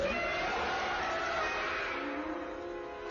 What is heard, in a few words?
Roar; Baby Zilla